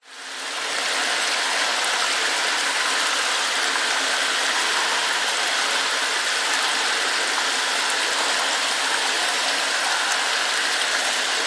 nature cave ambience fast flowing water running dripping distant